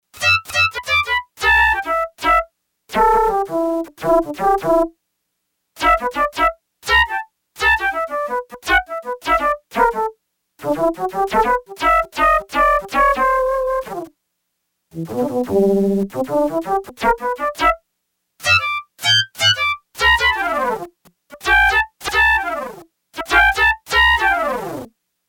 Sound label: SpitFlute, Yamaha TX802; Factory Patches; Voices